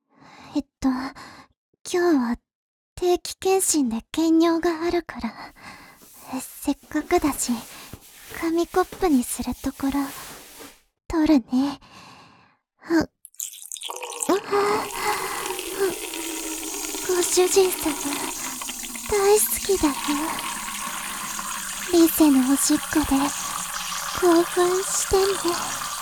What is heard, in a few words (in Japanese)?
紙コップにおしっこ